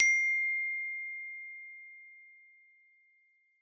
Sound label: Sms Alert